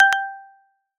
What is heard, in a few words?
chirp; iOS Sounds